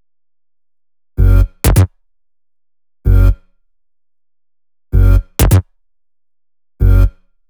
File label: Melody Kits 128BPM Root F#; Vengeance Samples